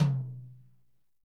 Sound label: TOM, Toms, Roland; Northstar - Drumscapes Roland